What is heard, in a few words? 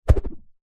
throw object spin whoosh air; foley